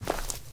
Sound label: grass, step; STALKER; minecraft